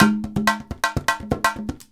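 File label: emelabo grooves; Best Service; World Colours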